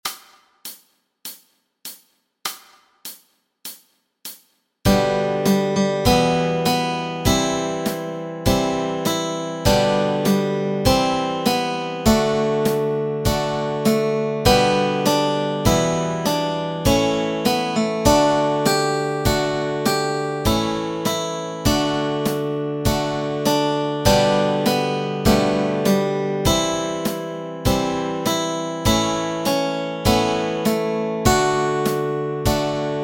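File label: melody and chords, Easy Guitar and Ukulele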